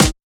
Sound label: Snare; FLPRO; Snares